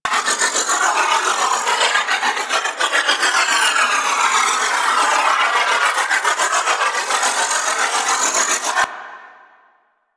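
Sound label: Crewship Heavy Cannon Projectile Loop; Vehicles; Crew Ships; Weapons; Warframe; Rail Jack; Corpus Crew Ship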